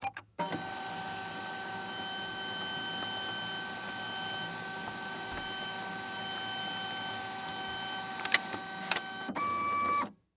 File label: Technology Electronic Machine Fax Machine Sending Fax